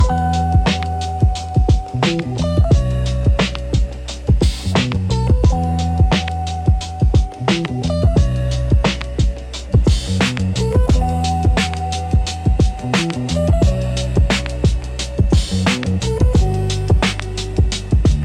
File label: dark lo fi glitchy sfx mid tempo; for tiktok